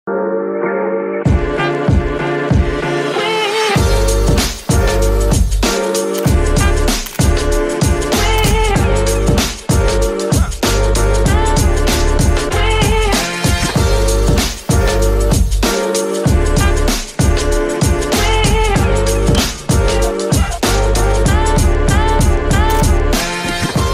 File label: oppo reno 2